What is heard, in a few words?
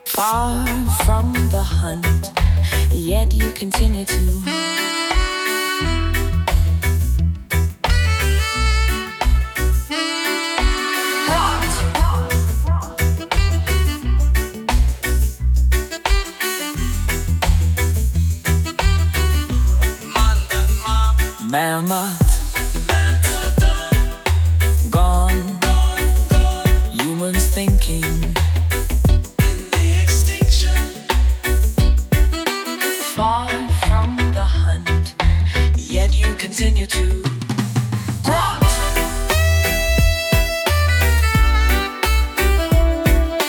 Reggae